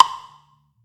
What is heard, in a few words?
Temple Block; Lunarlander 1969